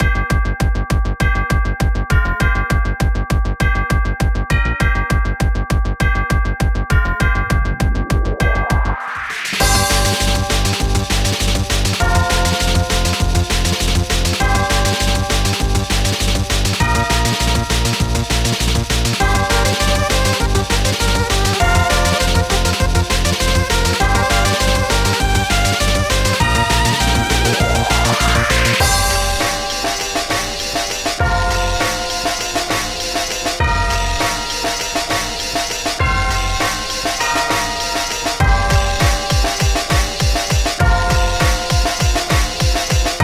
Instrumental

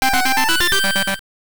All Gold Collected; Hyper Lode Runner; gb

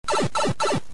pipe; mariopipe

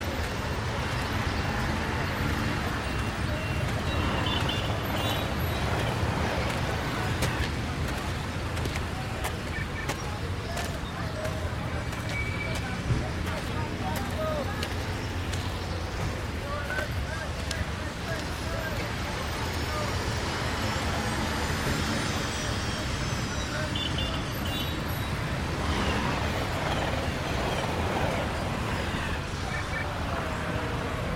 City Modern Small; Civilization V

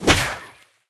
Tentacles Loopend; Level21 Thethingachievement

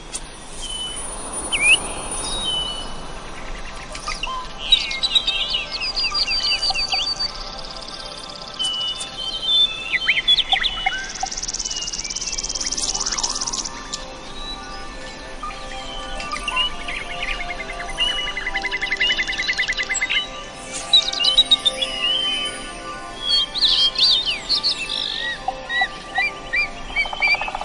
Ambient ~ The Fairy Glade; rayman 2

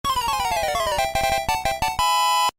Level Complete; Peetan; GB

